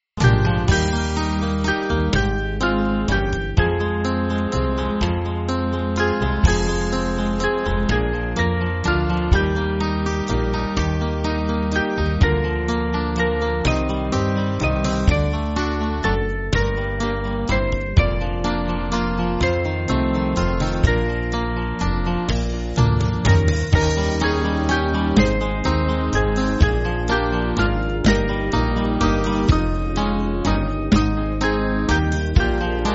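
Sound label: Music Backing Files for Churches; Behold A Little Child; Shebbear C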